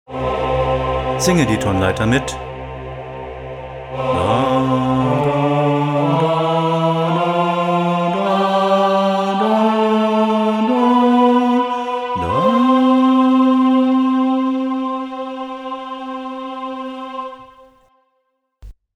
Durtonleiter mitsingen

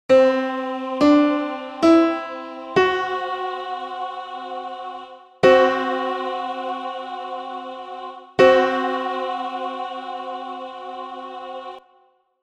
der Tritonis c f

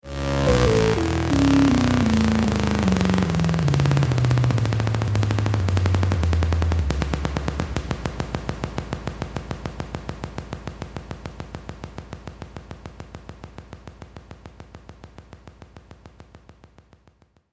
Synthesizerton immer tiefer

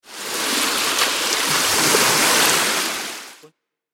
Wellenrauschen

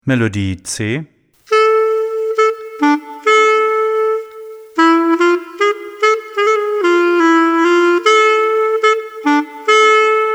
Melodie C und D

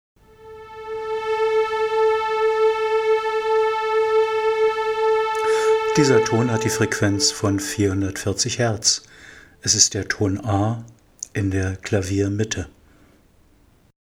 Frequenz der Ton a

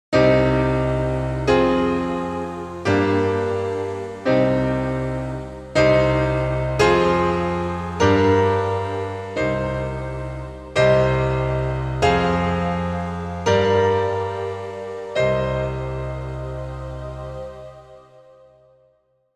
Kadenz reines Moll

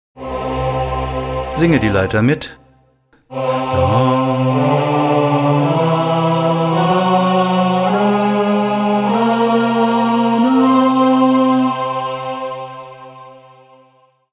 Ganztonleiter mitsingen

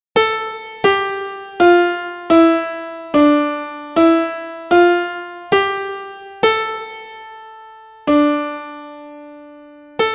Melodie

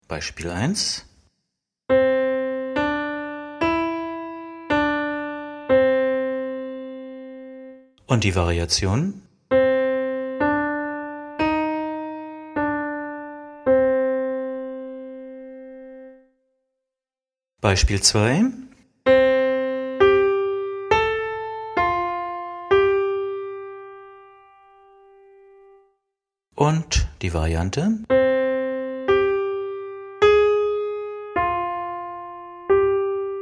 Intervalle hören

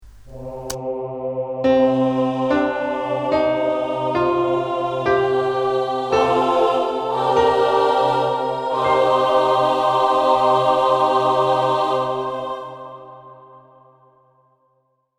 dorisches Moll

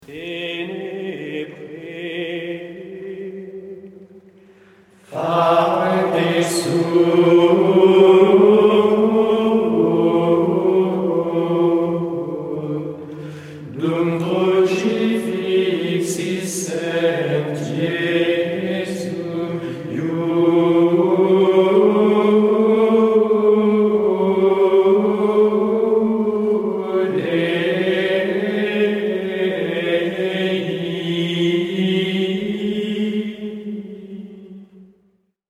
Gregorianischer Choral Te Deum

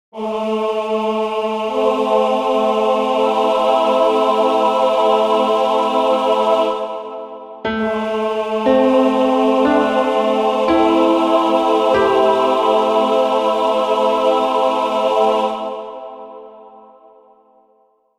Pentatonik über dem Mollakkord

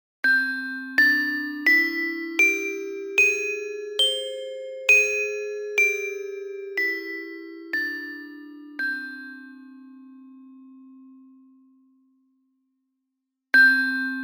Asiatische 5tonleitern

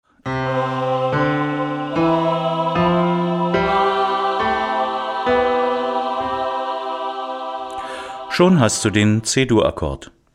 Dreiklang ableiten

